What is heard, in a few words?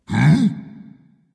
confused dragon; fafnir